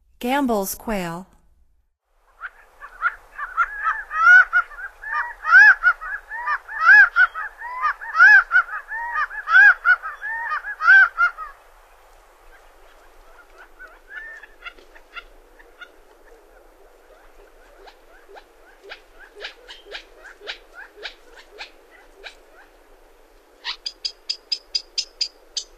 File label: Gambels Quail; North America; Stokes